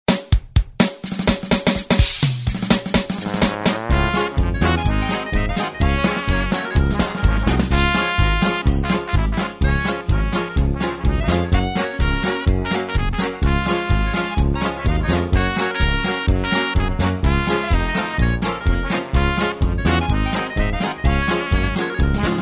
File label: harmony